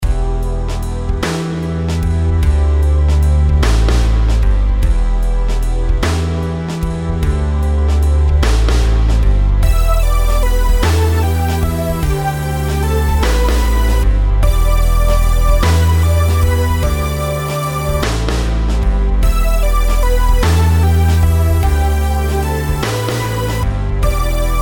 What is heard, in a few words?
rhythms compound 8s